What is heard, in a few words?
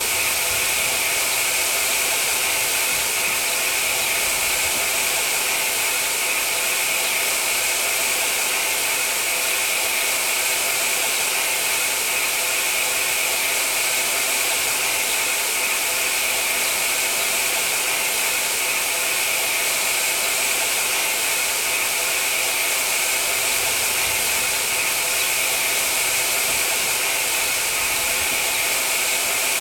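Scottish train station exterior; the 39 steps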